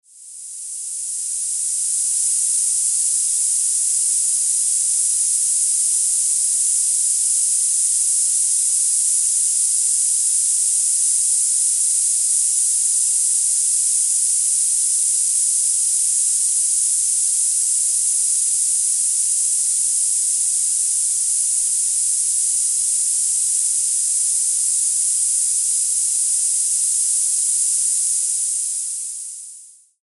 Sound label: tinnitus orbiter 10khz 12khz, bilateral; 10000 hz to 12000 hz